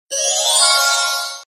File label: respect received